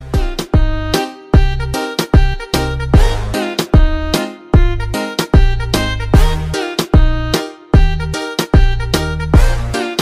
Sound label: bgm Ringtone